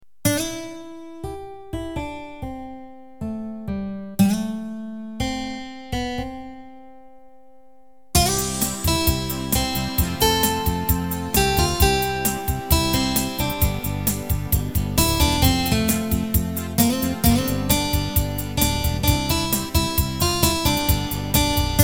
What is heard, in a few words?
Western